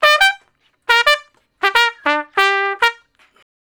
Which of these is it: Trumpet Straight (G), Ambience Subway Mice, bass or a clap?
Trumpet Straight (G)